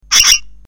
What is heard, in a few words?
bird